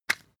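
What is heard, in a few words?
floss open compartment hard, bathroom; haus-garten; badezimmer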